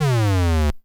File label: boss warn; dota; crownfall